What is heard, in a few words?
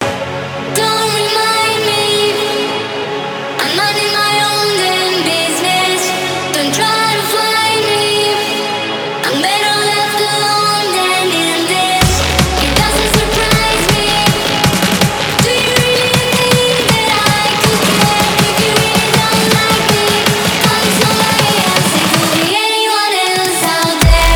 Hardstyle Remix